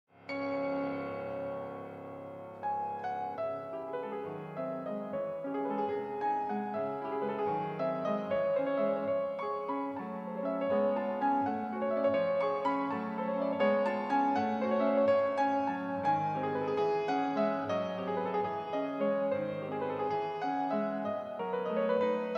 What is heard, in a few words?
Chopin Scherzo re imagined in jazz